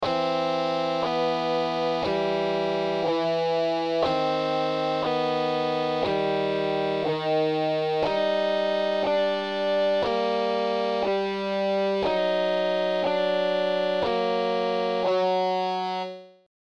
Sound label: metal intro; better metal snake